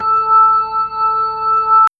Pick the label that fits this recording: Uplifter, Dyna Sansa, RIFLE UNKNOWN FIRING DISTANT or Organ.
Organ